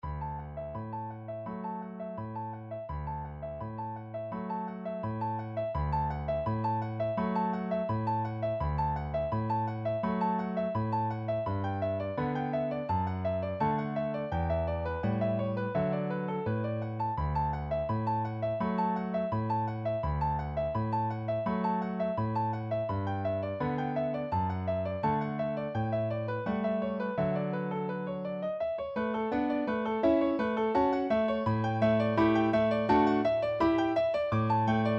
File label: piano; 春の小川